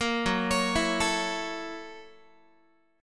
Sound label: F sharp 7th Sharp 9th, Guitar; F#7#9; 13,14,16,14,13,x; arpeggiated